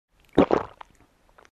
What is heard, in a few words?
Gulp